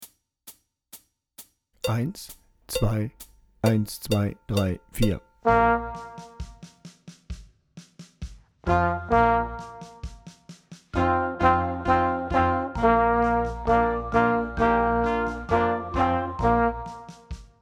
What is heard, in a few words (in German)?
Lets Rock Horn lauter